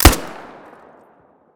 ak shoot, weapon, rpk

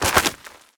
gravel footstep

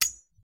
bullet hit metal; metro

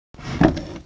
wooden Crate Open

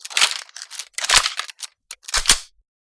vss load; metrocraft; weapon

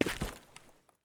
concrete footstep; metro

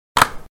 healing item pick down

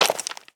stone footstep

